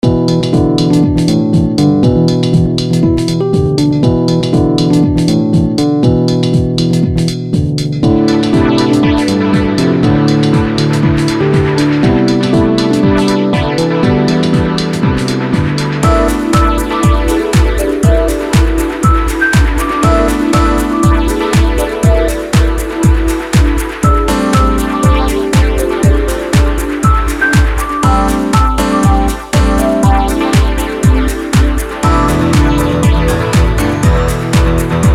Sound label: BEAT DISCO ELE, Beat Disco Piano Techno Strings; Miscellaneous Loops; Blastwave